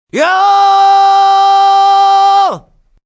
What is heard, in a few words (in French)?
chant; MOBICLIC 83; CPLUSCLAIR